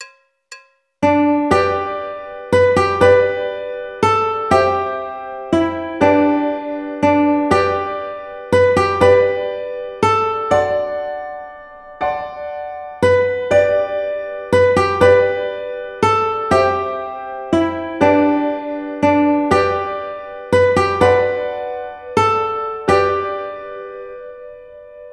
Ukulele; Amazing Grace; Jolly Roger Ukulele Book 1

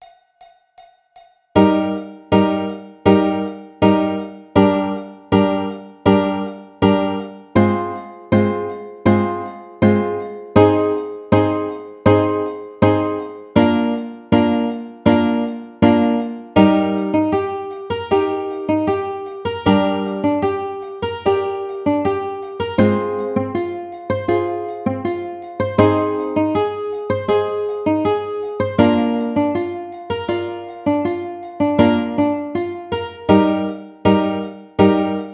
Eb Major Arpeggiator Ukulele; Jumping Flea Arpeggiator: Gradus ad Parnassum for the High G Ukulele; Arpeggio #6; Ukulele Key of Eb

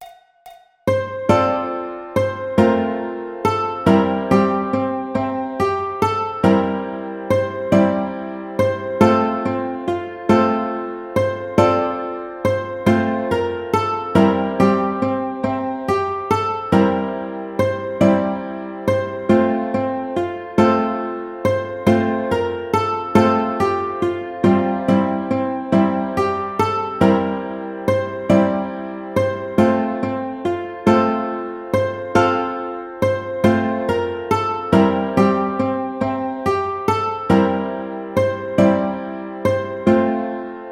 Carnival Of Venice Ukulele; Jolly Roger Ukulele Book 1

